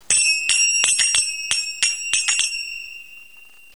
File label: plain brass zills